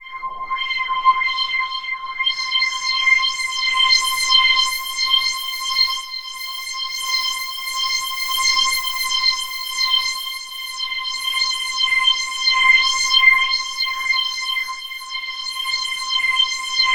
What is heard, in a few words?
C5, Korg MS-2000; Synthworx